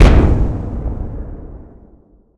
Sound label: gunshot